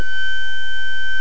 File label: steady